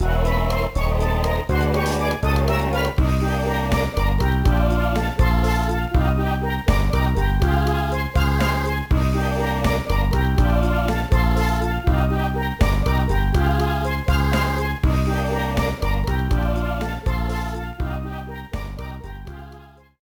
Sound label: Lose; MGAT; Bogey